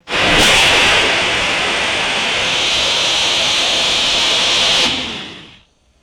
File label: loud sustained rocket thruster engine blast; aircraft